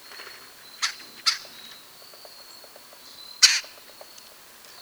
Red bellied Woodpecker; woods

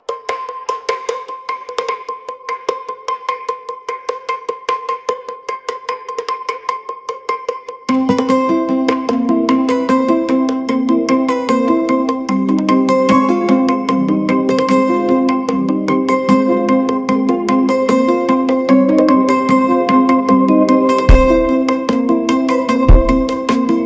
ai jingle generator sample

